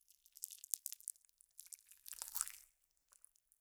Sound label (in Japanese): 抜く音; 効果音